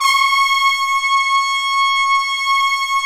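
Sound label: BRS, Trumpets Section 3 f; Roland L-CDX-03 Disk 2; BRS Tpts Sec.2-3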